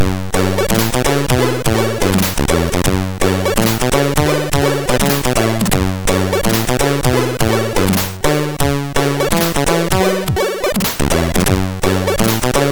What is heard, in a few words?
Jazzy Mood, MOS6581R3